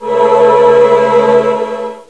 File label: heal